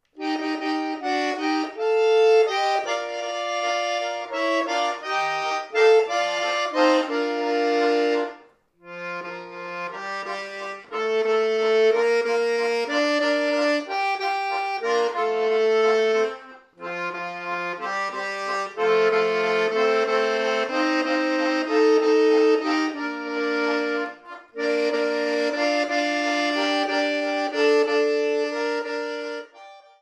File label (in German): kellerboden walzer; oergelinoten